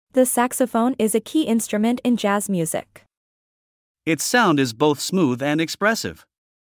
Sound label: Saxophone